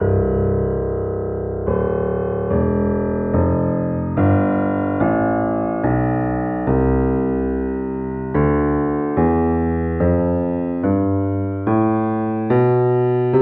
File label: c scale in all registers